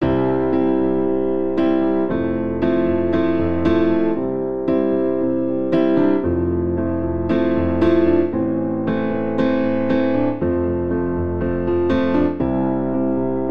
Progression Uplifting I - IV - vi - IV C Major 116 bpm, Grand Piano; MIDI Clips; Ableton; Factory Packs